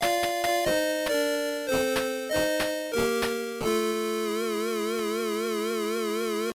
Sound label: game over; sonic 8bit; sonicchaos